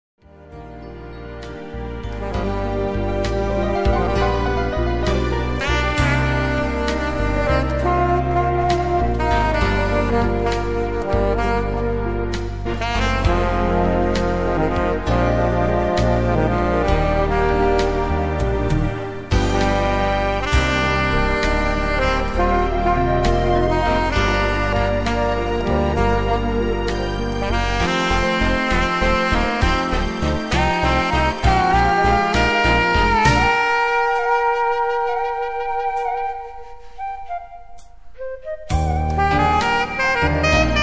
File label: sax; jazz standards